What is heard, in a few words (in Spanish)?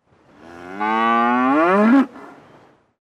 vaca